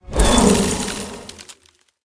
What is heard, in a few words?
vine throw; smite; god artemis